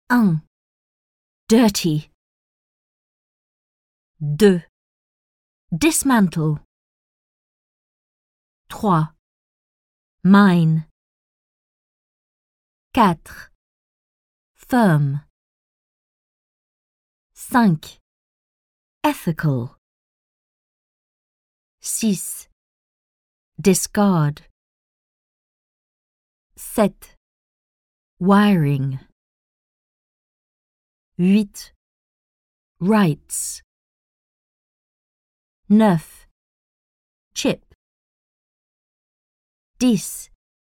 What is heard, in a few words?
techno